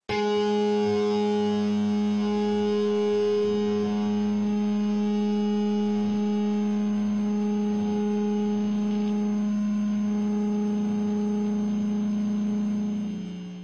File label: heavy harmonic tune up 5th, guitar